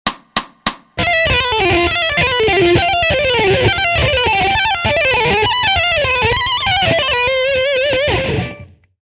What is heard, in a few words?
Pick Taps fast